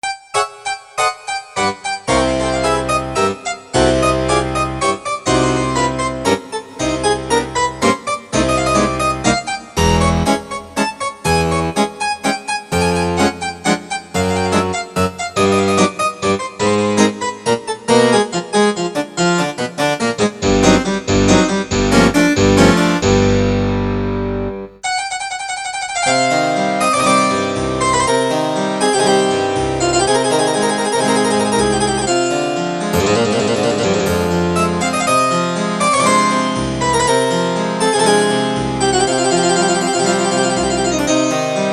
Harpsichord

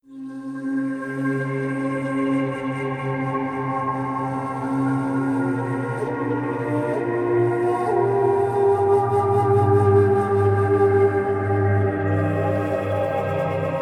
Drums & Soundscapes

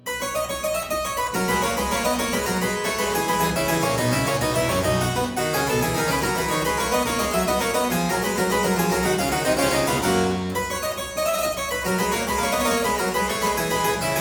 Aria in C major Variatio 4, Harpsichord

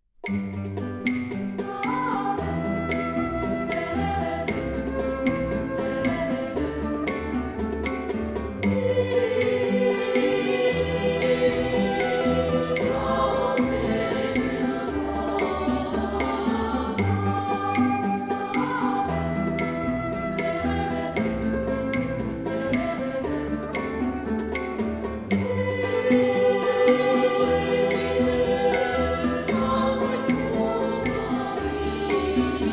Folk Songs; Eu a você (Brazil)

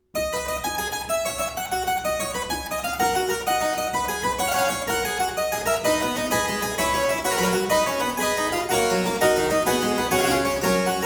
Suite in C major Gigue, Harpsichord; Buxtehude; Complete Harpsichord Music

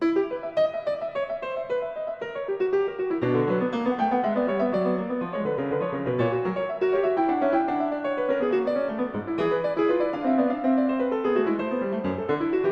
Fugue in e-moll, Keyboard; Bach; The Well-Tempered Clavier - Book 1; Fugue No.10; BWV 855

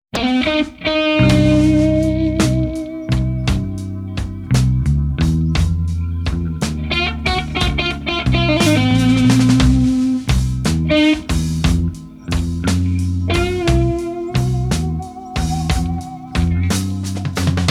(Instrumental)

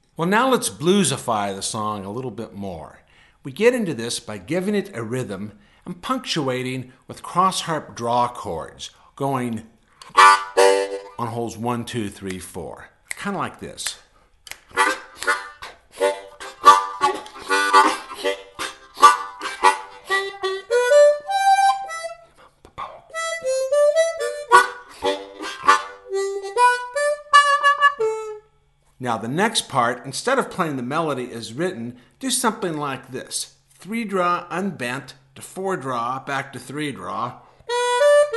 On Top Of Old Smokey - Blues Jam, harmonica

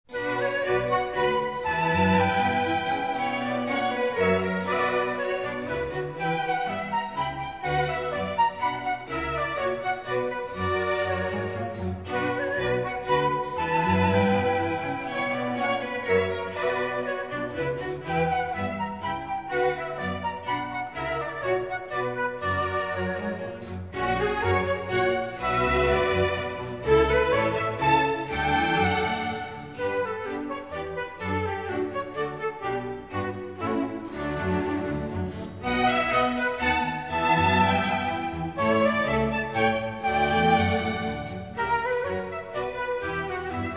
Orchestral Suite - Menuet; Bach; Orchestral Suite No.2, BWV 1067

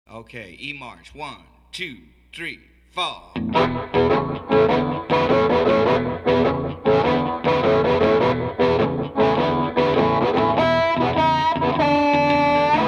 E March with Harp; Blues Harmonica Masterclass

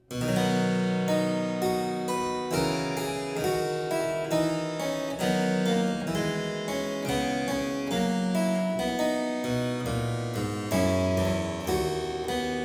Suite in C major Sarabande, Harpsichord; Buxtehude; Complete Harpsichord Music; BuxWV230

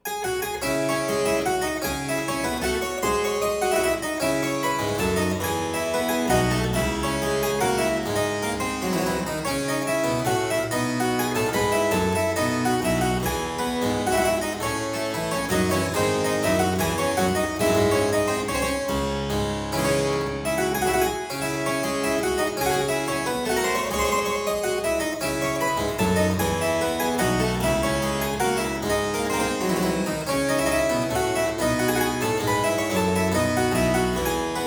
Courante; Buxtehude; Complete Harpsichord Music; Suite in D minor (ed. E.Roger, 1710)

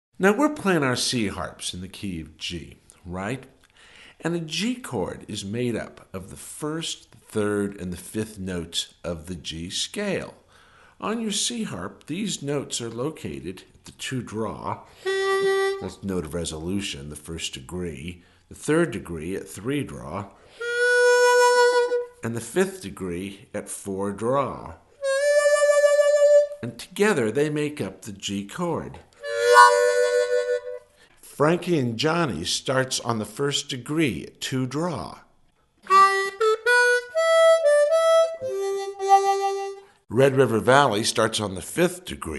G Chord, harmonica

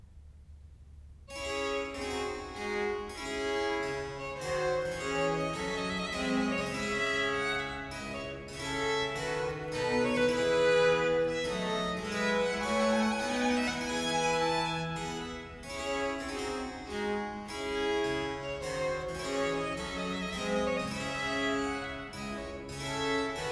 Air - Hornpipe - Jig; Purcell; Theatre Music; Decca

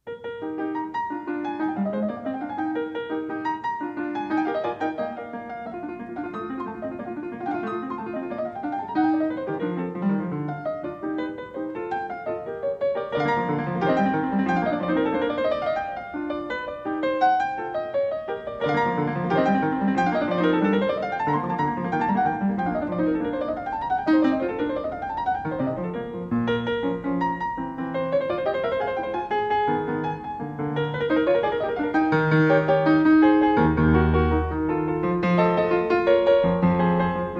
Piano Sonata In E Flat Major Allegro; Wolfgang Amadeus Mozart; Piano Sonata No. 4

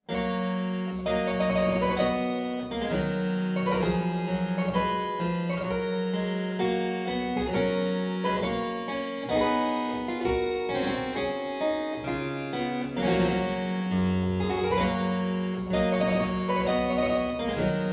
Aria in G major Partita 23, Harpsichord

